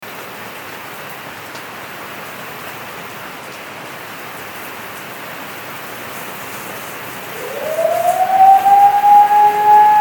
thunder storm with sirens

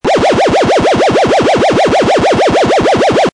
siren loop

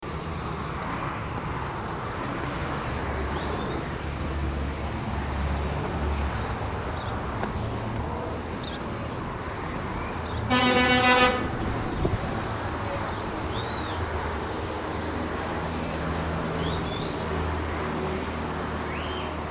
traffic highway people noises

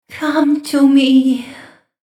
female horror voice siren

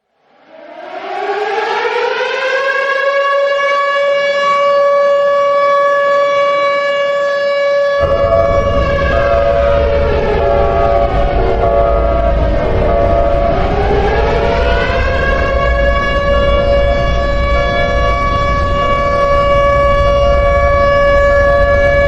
eas alarm, sirenes; mayotte

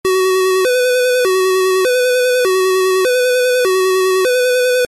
siren dutch emergency services

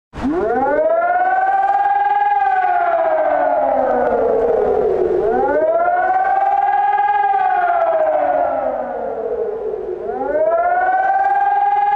germany eas alarm real

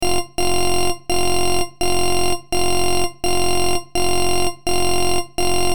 digital alarm

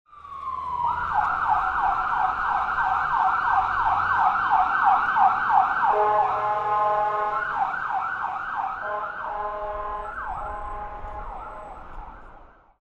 ambulance

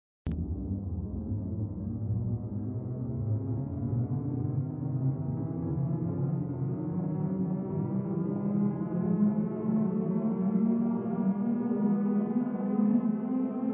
siren c3

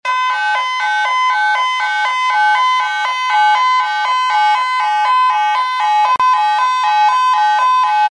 fire alarm foley

